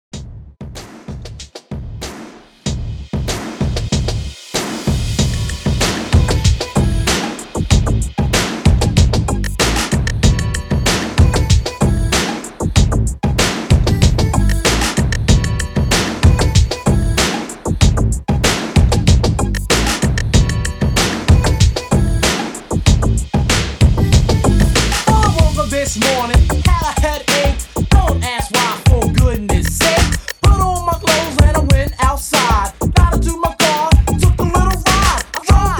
80S REDRUM 95 BPM

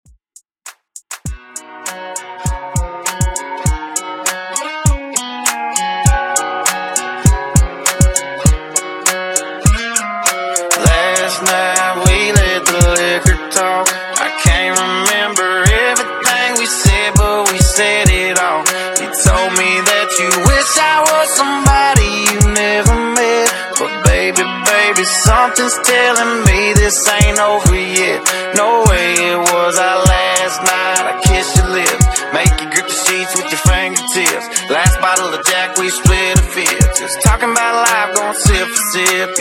Country Rock ReDrum 100 BPM

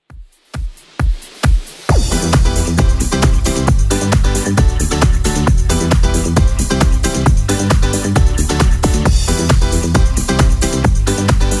90s Redrum 134bpm

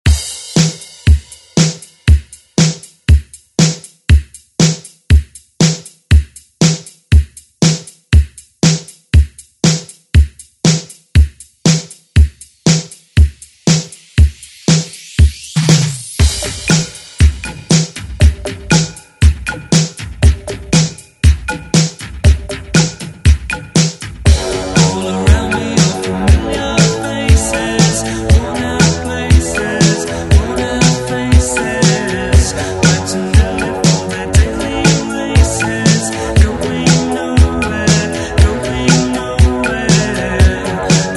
80s ReDrum; DJ Mhark

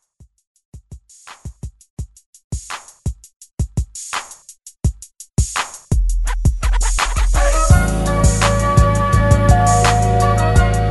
70s Pop; Redrum

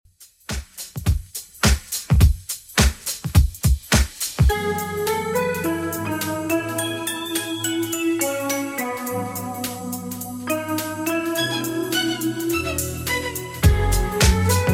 90s Pop Soul Redrum 105bpm

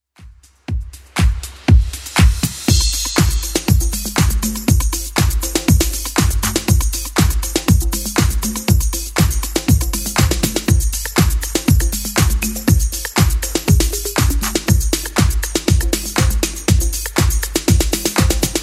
80s Club Edit 120bpm

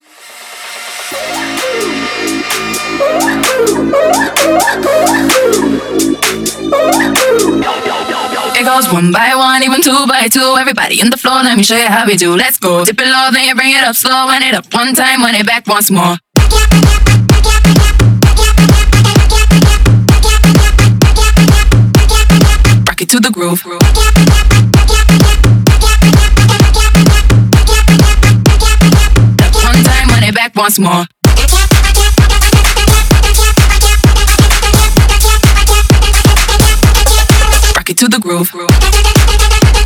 Mashup 129 BPM

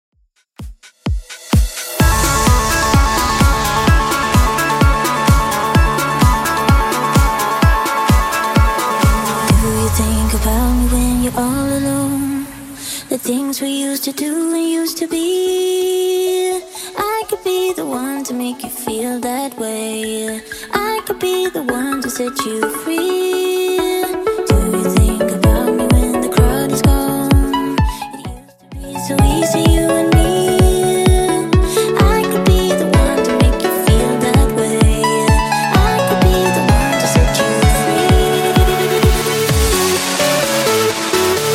2ks Redrum 128bpm

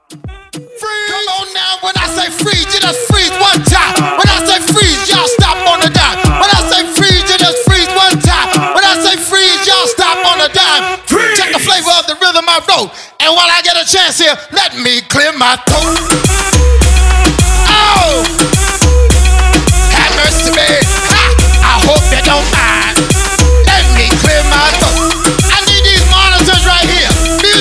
Party Break In Outro 105 BPM

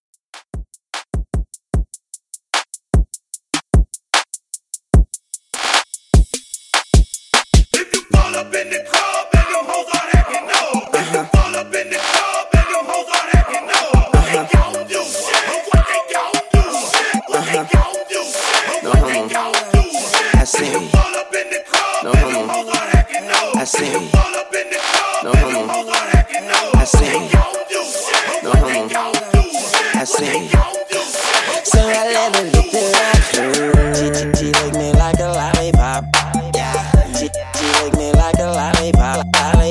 Hype Intro 150 Bpm; Redrum

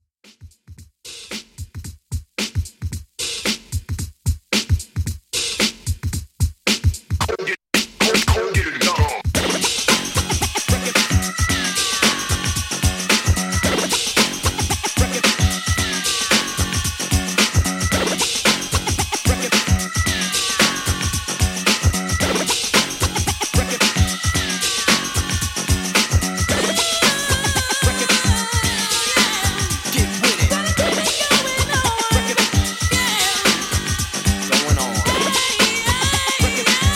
Scratch Old School 112Bpm

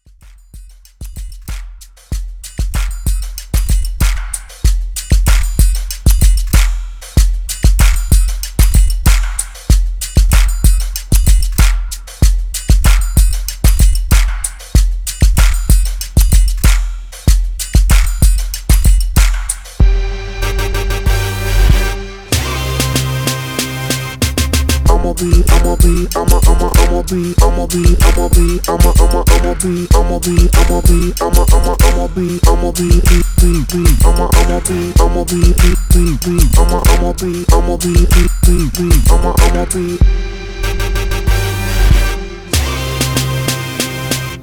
Re Drum 92 Bpm

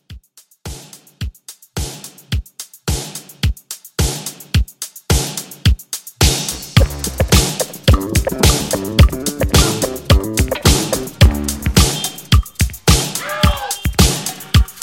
80s Disco Re Drum, 108

